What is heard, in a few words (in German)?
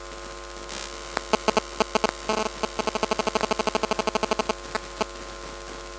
handy, elektrosound